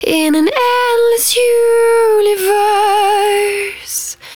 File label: 110bpm Ab, Velvet Vocal; Zero-G